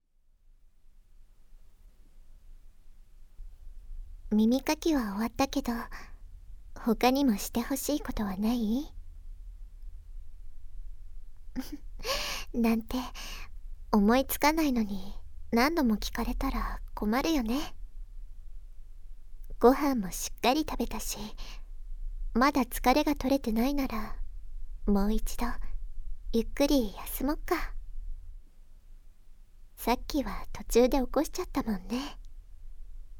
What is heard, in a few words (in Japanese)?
耳舐め 手コキ, ASMR; 寄り添いながら耳舐め手コキ